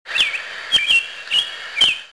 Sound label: bird; Solitaire